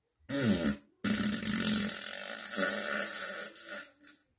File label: loud toilet fart